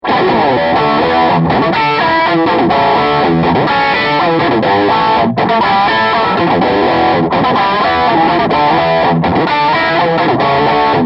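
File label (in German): 1 Oktave Up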